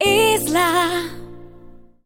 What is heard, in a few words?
Soundlogo; ISLA; JFK Spot